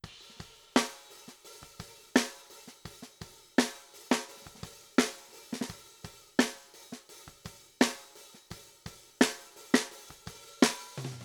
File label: debleed snare OFF